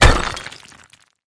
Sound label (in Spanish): picar